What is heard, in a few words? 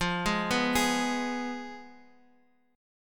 F Diminished Guitar Open F; x,x,5,3,2,3; Fdim arpeggiated; JGuitar